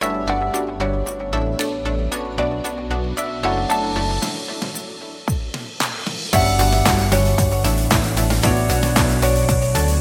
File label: Background Music